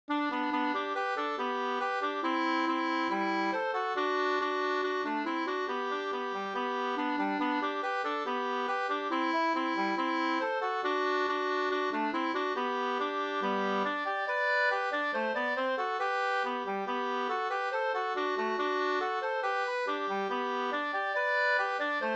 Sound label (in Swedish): schottis; Lilla schottisen Aland 2st V1